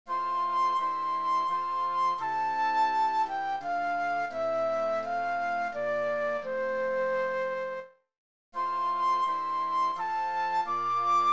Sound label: flute